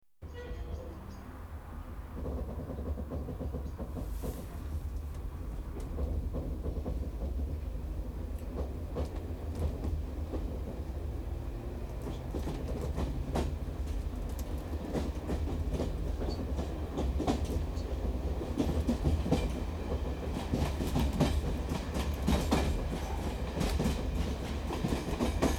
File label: Electric Trains Train starts; The BBC Sound Effects Library